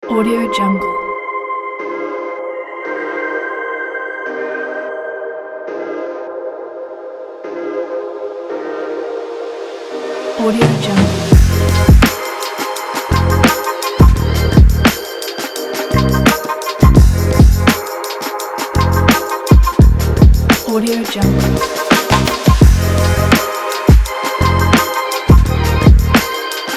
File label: ambient chill hip hop; butterflystudio